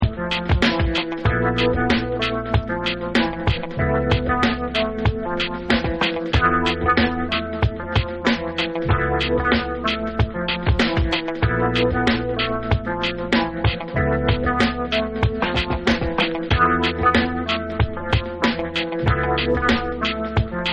Instrumental

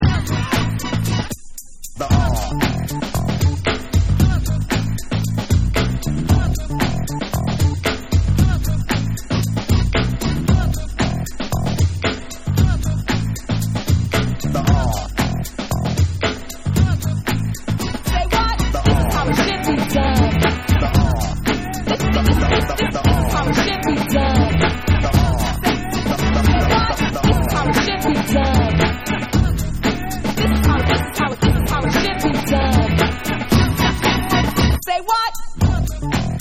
Instrumental

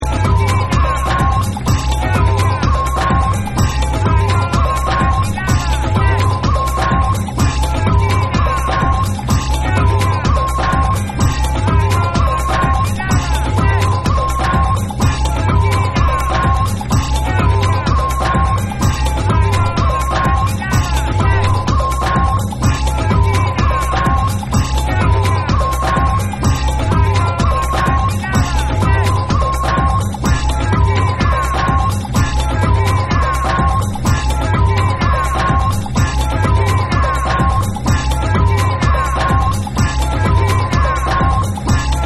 LATIN, Dub

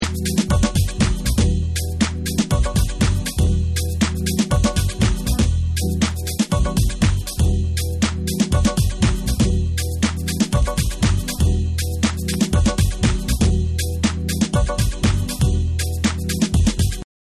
Instrumental

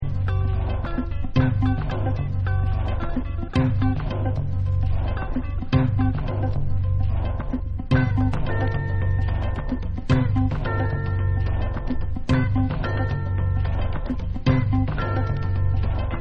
Live Guitar Mix

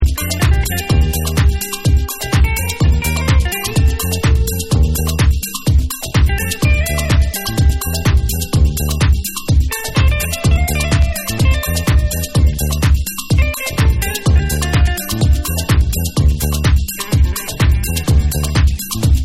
Hard But Soulful Mix

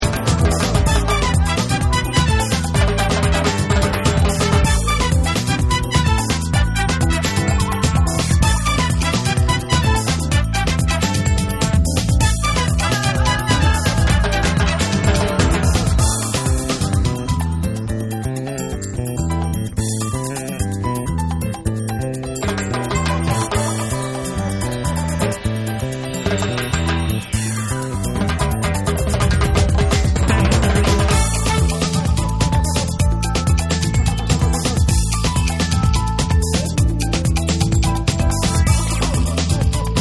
BRAZIL HORNS

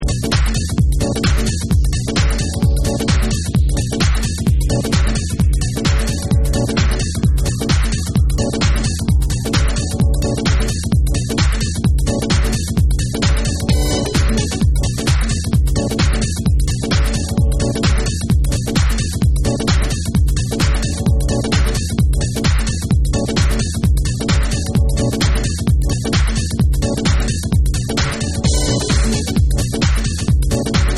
HYDROGENATED FUNK

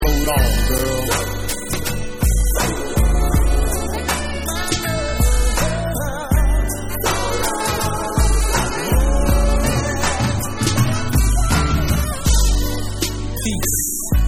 Vocal

